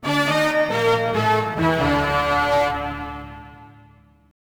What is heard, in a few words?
music mission fail; Ty The Tasmanian Tiger 2 Bush Rescue; XBOX